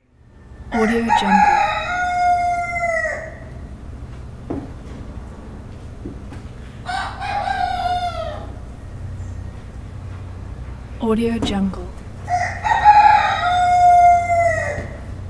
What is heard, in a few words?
barnyard with animals